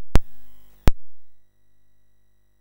Commodore 64 Christmas BASIC MOS6581R3; Commodore 64 Christmas Album

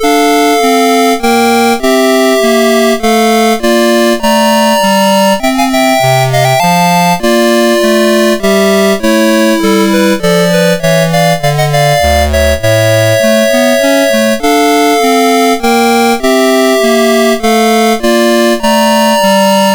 Brandenburg Concerto No1 Trio, MOS6581R2

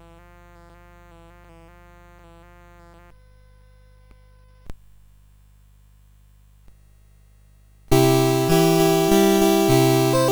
CSG8580; CSG8580R5

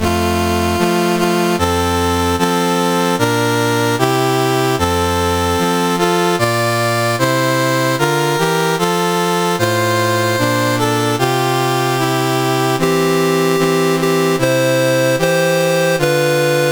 Tonga, National Anthems, CSG 8580; CSG8580R5

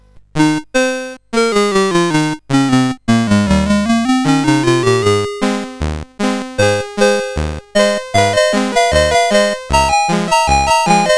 sid, CSG8580R5

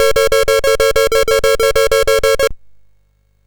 Synth Sample, MOS6581R3

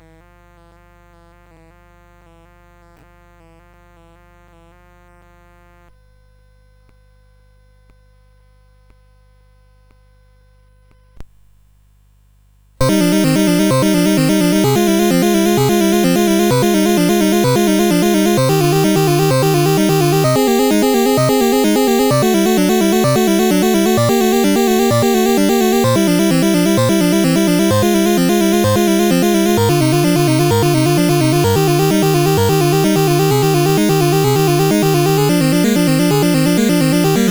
Praeludium II, CSG8580R5

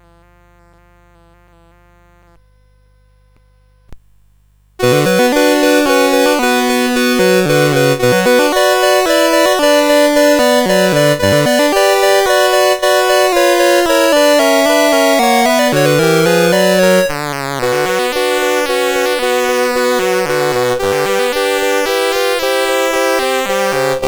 Prelude in B Minor Op28 No6, CSG8580R5

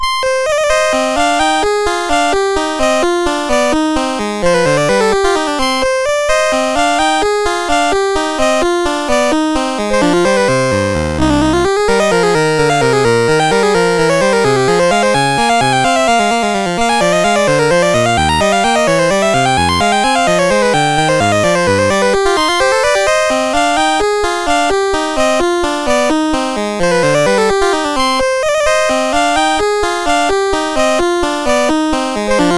MOS6581; MOS6581R4

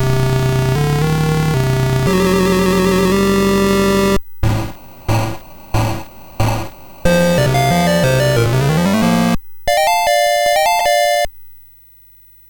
Musicbox, MOS6581R3; hvsc

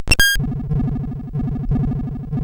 Die Alien Slime, CSG8580R5

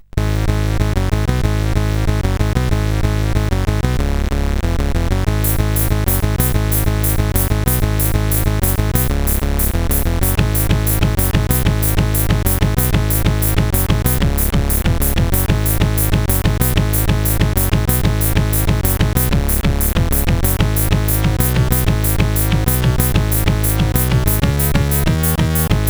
CSG8580R5

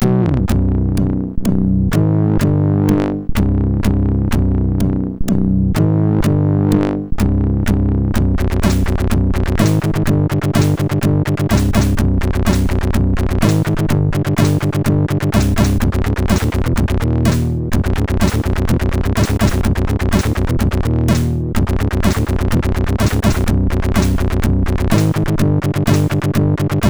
Death Metal MOS6581R4

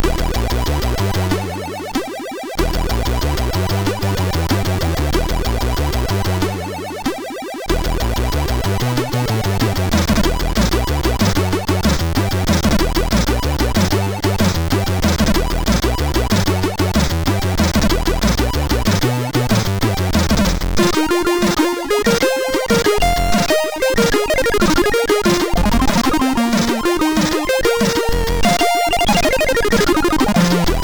sid MOS6581R3

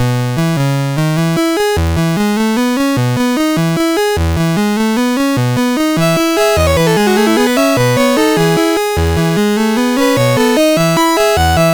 Electric Melody, MOS6581R3

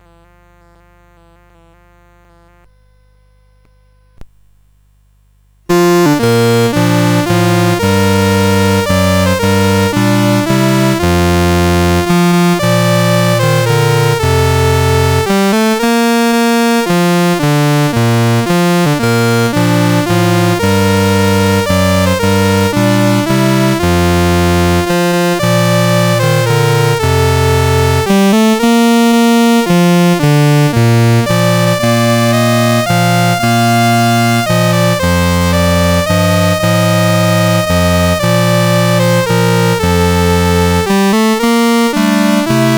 Star Spangled Banner, CSG8580R5

